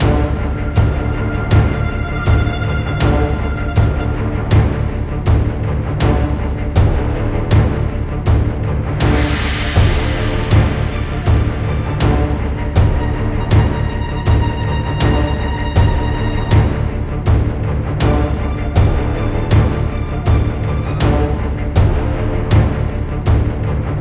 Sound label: Battle Loop